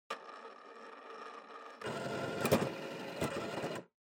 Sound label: Time Recorder Power On